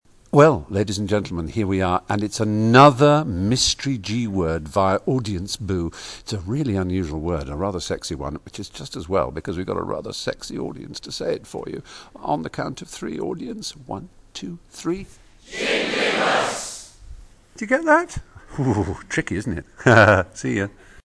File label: audience boo; qi; mystery g word